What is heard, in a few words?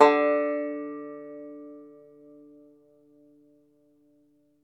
FLATTOP, Flat Top Banjo